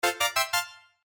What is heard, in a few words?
notification fairy; お手伝い妖精3; 通知音